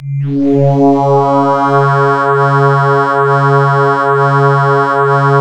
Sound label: C#, Synclav FM; Big Fish Audio; SPACE1